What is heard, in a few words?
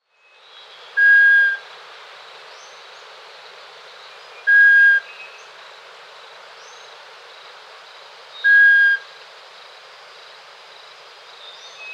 Call and contact calls, Birds of the Costa Rican Cloud Forest